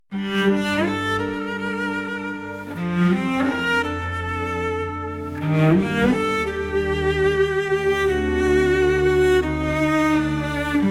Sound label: Electric Pulse